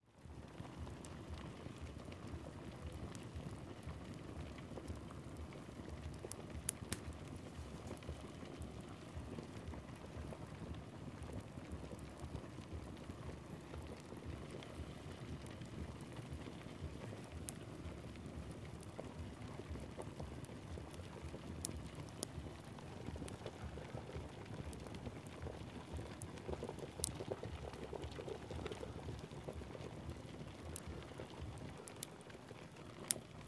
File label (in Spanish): COCINA A LEÑA, FUEGO Y SOPA HIRVIENDO INTERIOR CASA COLCA